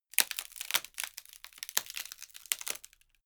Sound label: wood break slow